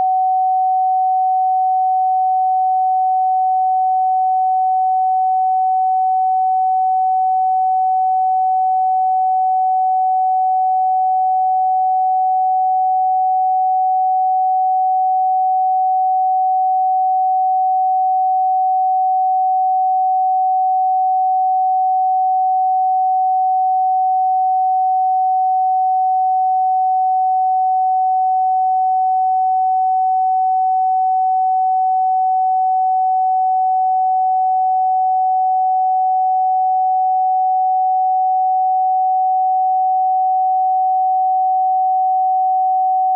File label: 750hz sine; new sine tests